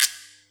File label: Yamaha EX5, Drums